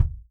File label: Real Drum Kit, ROCK KIT